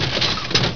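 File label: PINBALL TABLE, MACHINES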